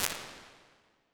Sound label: Guitar Hall, impulse responses, medium spaces, halls; Apple